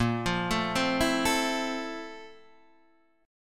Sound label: F Major 7th, Bb; Fmaj7/Bb arpeggiated